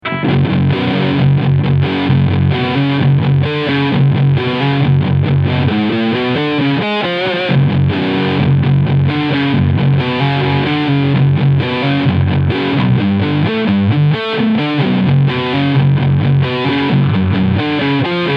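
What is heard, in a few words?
Schecter C 8 Multiscale Silver Mountain Distortion Steg Humbucker Riff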